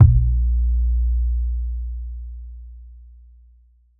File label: fat tr 808 synth drum kick a sharp key